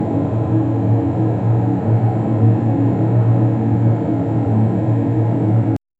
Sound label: reverb chamber ambience